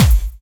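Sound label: snare drum bass drum, Dance Mega Drums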